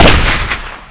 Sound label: sniper; weapons; svencoop; hunger; thweapons